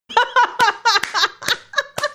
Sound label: laughing clapping